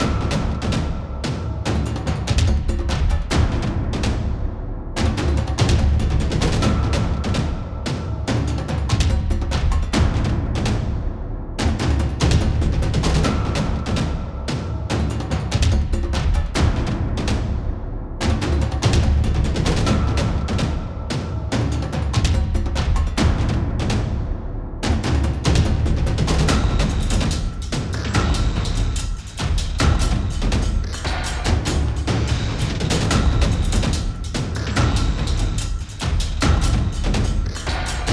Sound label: Battle Perc Loop; skykeepers; ps4 windows xbox one gamerip 2017